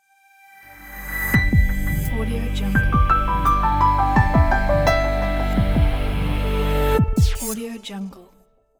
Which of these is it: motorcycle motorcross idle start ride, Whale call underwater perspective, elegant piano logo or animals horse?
elegant piano logo